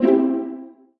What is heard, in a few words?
PUZZLE Success Violin Pizzicato Three Note Dark Wet; stereo; Universal Sound FX